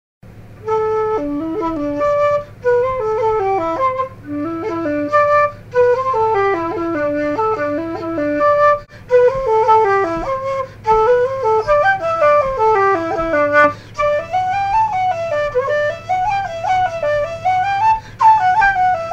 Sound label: flute test